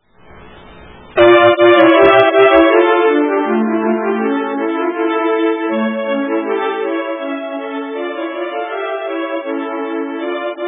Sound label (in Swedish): Dr Nystroms polska